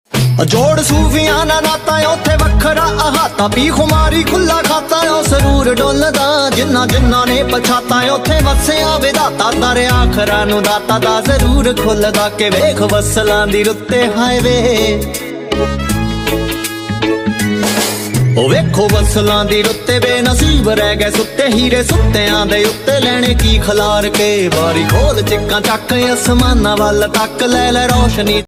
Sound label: Punjabi Ringtone